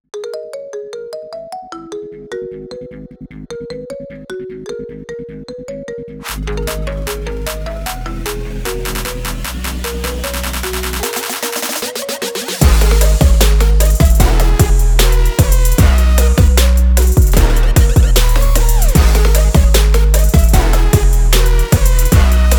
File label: Ringtone Phonk Trap Intro Ident